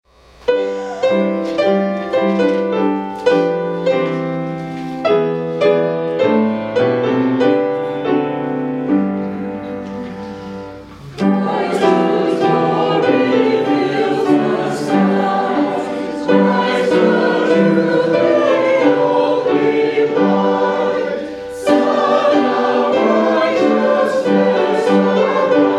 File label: hymn; Christ, Whose Glory Fills the Skies